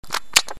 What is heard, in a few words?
Suck; Lewd SFX